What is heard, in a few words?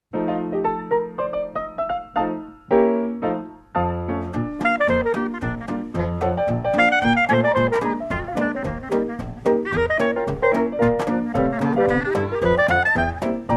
Creole Clarinet; Ren & Stimpy